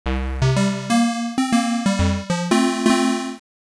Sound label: detuned